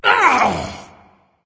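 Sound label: non verbal ouch, voice; cernunnos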